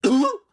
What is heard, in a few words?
death hit; Hollow Knight; Collector